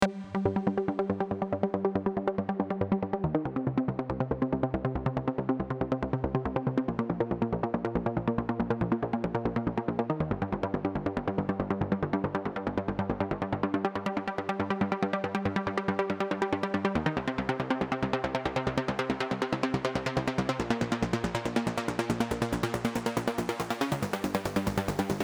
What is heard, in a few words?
Arp Test; MonoPoly